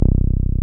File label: 303, TB-303 BASS